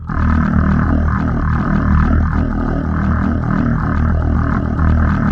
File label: monk